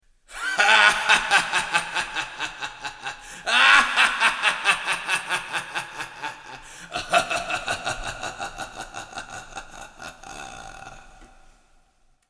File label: Laugh Horror; Voice123; Vincent Price